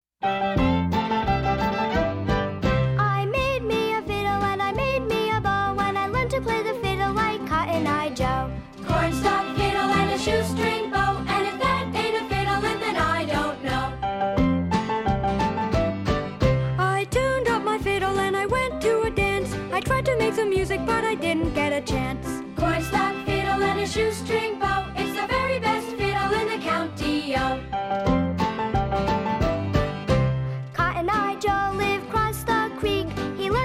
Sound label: Cornstalk Fiddle Shorstring Bow; From Music and You, Sample Compact Disc for Grades K-8; Macmillan Publishing Company